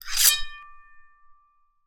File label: warfare sword pulled from sheath british naval cutlass 19thc; sound effects one